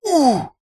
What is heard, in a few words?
human male vocalisation pain ohhhh; zapsplat